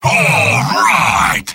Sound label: sniper cheers; vo; tf2; mvm